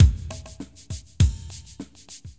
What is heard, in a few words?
Ballad 100, Drums; HDR-Studio™ 2.1 Demo; Loops Demo